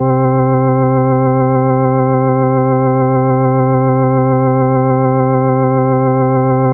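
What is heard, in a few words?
organ C3, Keys; Polyend; Simulacrum Sounds; 1 Shots; Acoustic and Electric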